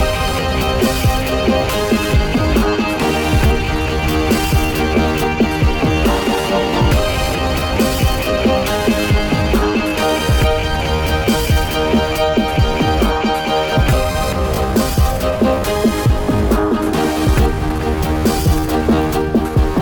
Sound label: Music Results